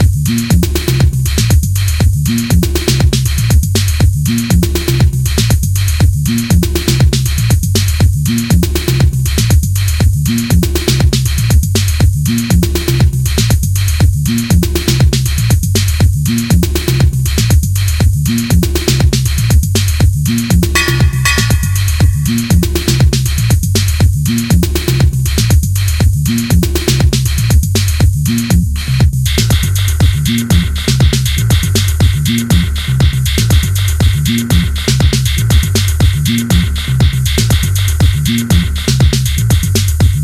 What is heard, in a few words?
Instrumental